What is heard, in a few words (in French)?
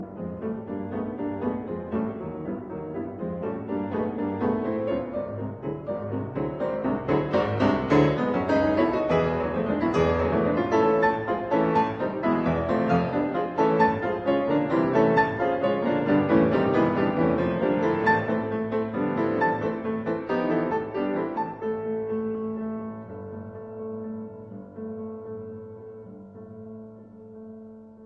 Etudes Pour les accords